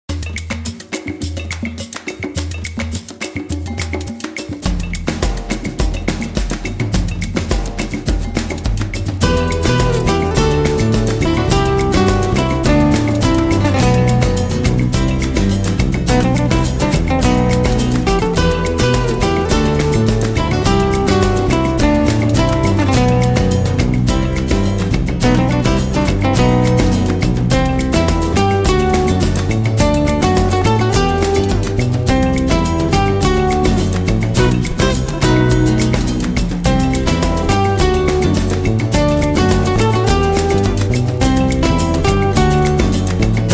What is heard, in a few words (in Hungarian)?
Flamenco